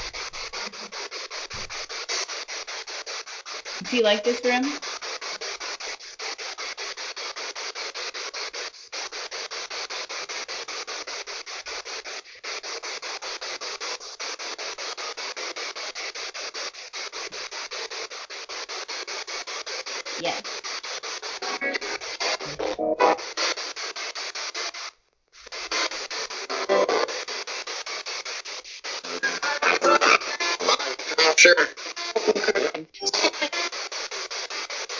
Childrens Reading Room Spirit Box; andrew bayne memorial library follow up investigation

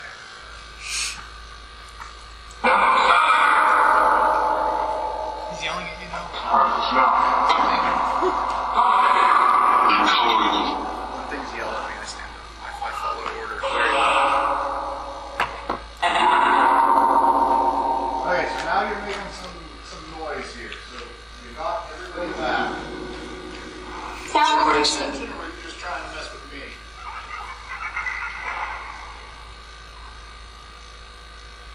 Auditorium Phasma Box

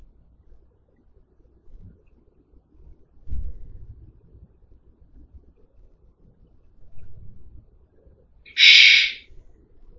Static

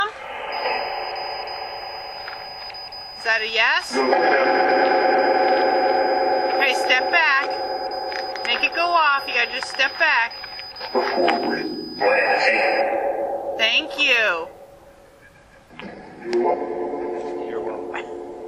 Open Field Phasma; martinsburg roundhouse investigation

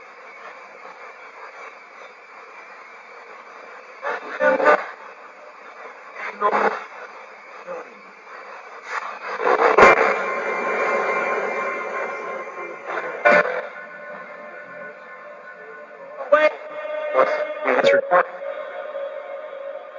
Library Spirit Box

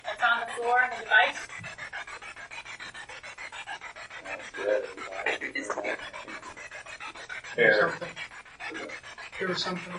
Basement Spirit Box; gill house investigation

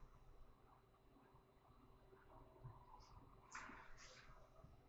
2nd floor open space EVP; caliente pizza draft house crafton investigation

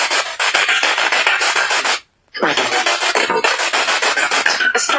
Spirit Box; Attic Small Room

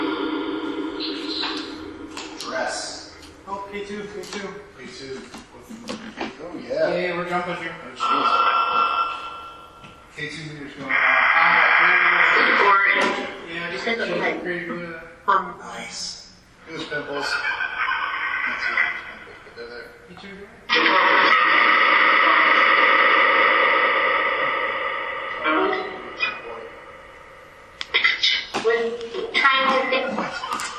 Dungeon Orphanage Phasma; fairfield county infirmary investigation; 4th Fl

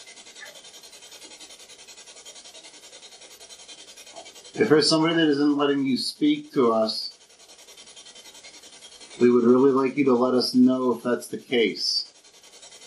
Room 428 Spirit Box

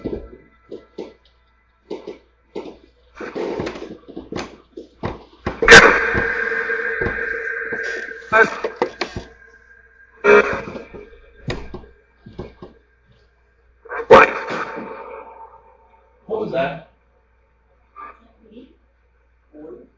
Living Room Portal

